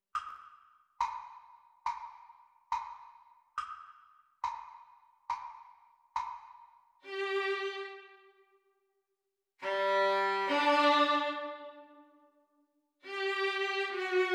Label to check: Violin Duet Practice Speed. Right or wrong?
Right